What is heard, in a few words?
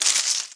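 hit leaves; jn4demo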